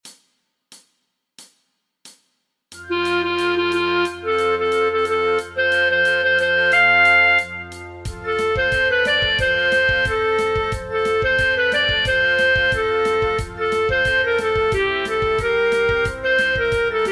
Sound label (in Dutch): voor oefentracks fluit